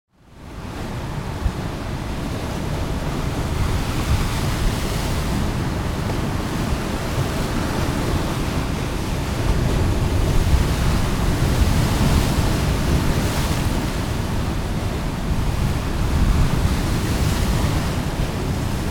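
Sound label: Surf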